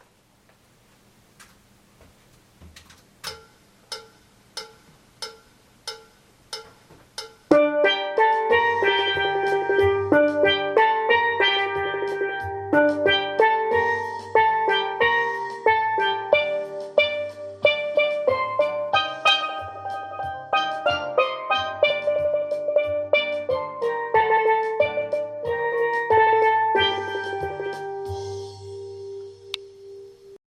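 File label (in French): TENOR Harmo 3 voix + basse 92, Steel Band; bella-ciao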